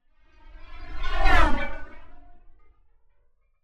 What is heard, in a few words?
Space Ship By - Very Fast, Light Metal Clanking; Hollywood Edge; sound designer's toolkit v2